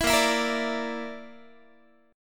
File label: Bb Major Flat 5th, Guitar; BbMb5 strummed